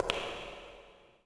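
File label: echo walk, footsteps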